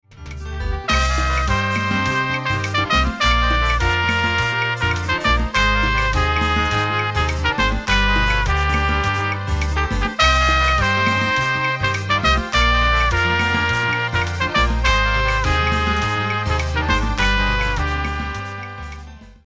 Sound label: Trumpets